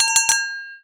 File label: Dingaling